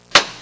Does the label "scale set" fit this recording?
No